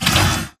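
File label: Stalker Movement Servo Heavy Short; Titanfall 2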